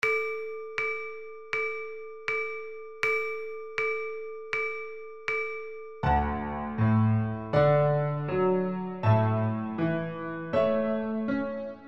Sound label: Piano; Pachelbel